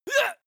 male attack groan short; schreie; menschen

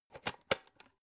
electric whisk insert whisk; haus-garten; kueche

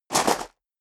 step sneaker gravel medium jump

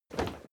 step crowd run quiet single left; schritte

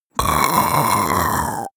monster choking zombie; comedy-cartoon; soundpool

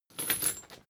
drawbridge chain put down; maschinen; metall; soundpool

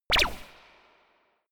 space laser gun double shot; krieg-waffen; soundpool

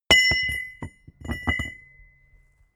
small metal massive drop

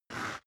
step boots concrete grit slide short; Footsteps; schritte

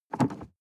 sports car int pedal gas step and release hard; autos; verkehr transport

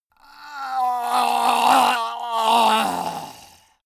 male die gurgling; soundpool

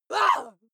female lunatic scream short

